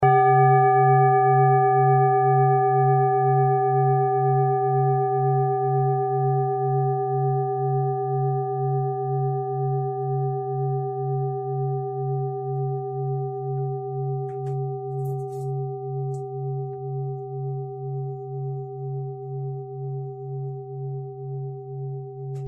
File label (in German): klangschale set; Klangschalen-Chakraset N°12 für alle Chakras